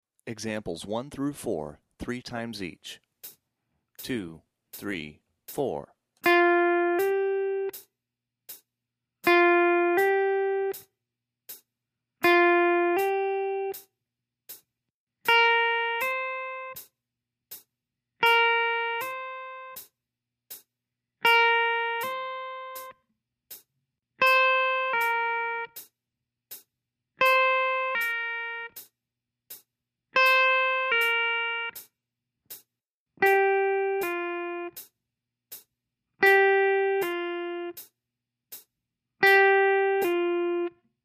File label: slurs, blues guitar